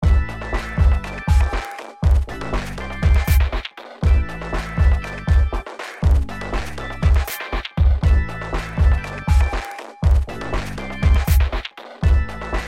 drumloops Both Sections

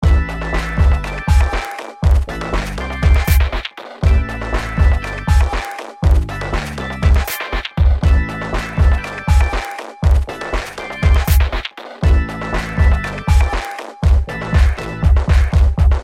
drumloops Groove And Fill

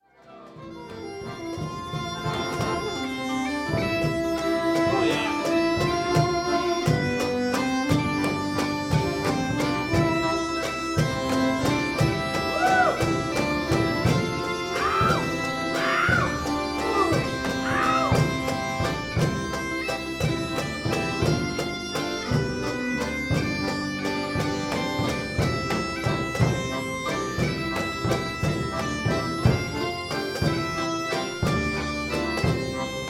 bagpipe band; zoom h2

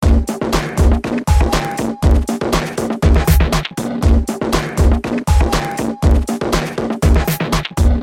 drumloops 2 Loops Layered

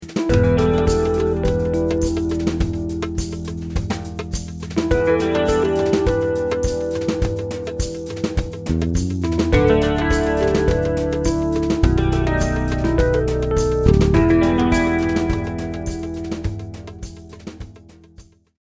Dumbek

